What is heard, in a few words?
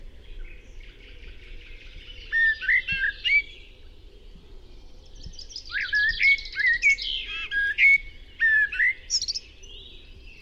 turdus merula